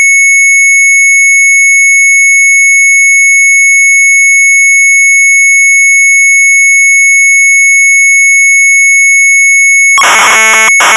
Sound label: Sharp MZ800 Tape; HOLLANDIA klok; retromelsarchive